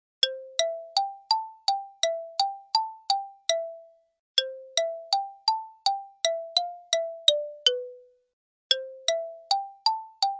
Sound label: lullaby music